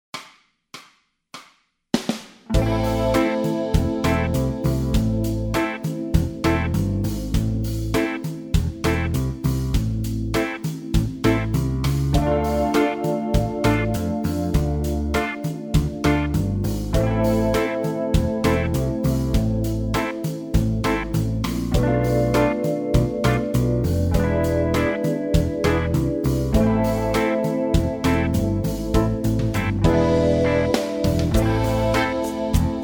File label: guitar scales, backing tracks